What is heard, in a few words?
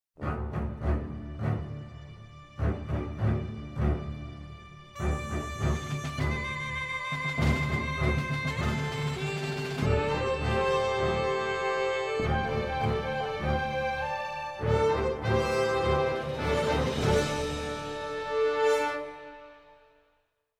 Music